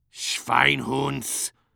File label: Medic negative vocalization